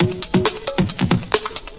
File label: 134.5 play latin, drum loops and breaks